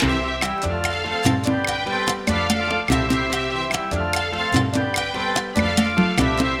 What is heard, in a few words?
danzon d section nuevo ritmo